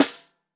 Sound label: SN Bottom, Snare